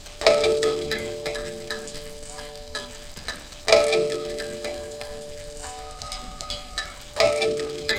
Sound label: rain heavier